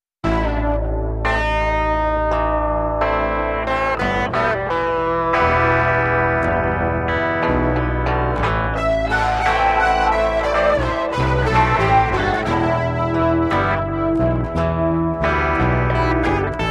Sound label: Loop